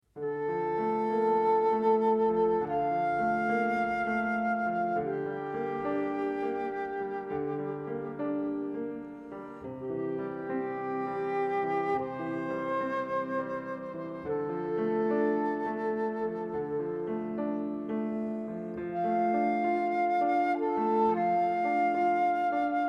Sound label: pieces for flute and piano